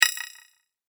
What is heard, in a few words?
multimedia rollover, Multimedia Rollover Tone